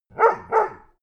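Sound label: bark twice, dogs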